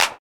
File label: clap a sharp key; percussion; single hits one shots